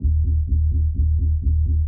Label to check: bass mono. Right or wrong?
Right